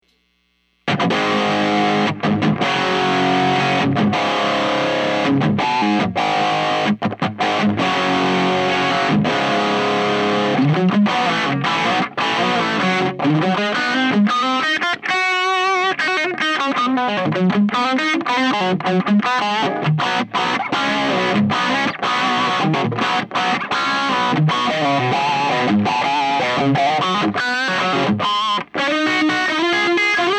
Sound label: hiwatt cranked; fanes